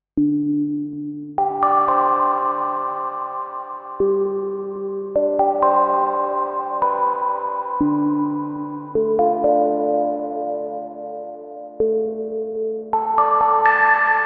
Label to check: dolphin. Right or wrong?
Wrong